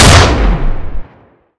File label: alteration ammo gun, weapons